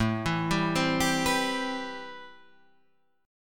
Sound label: A-Minor 9th A